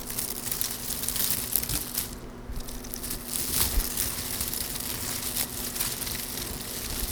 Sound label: touching dead flowers